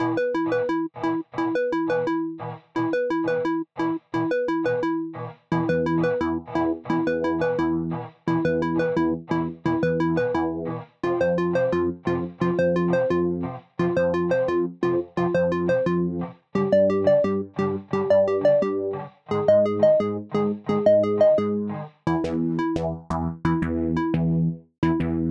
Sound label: comical